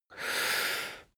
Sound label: male breath medium in